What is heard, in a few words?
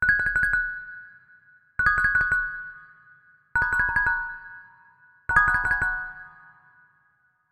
Marimbach